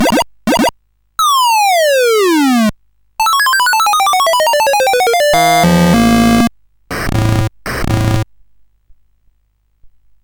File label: Musicbox, sid CSG8580R5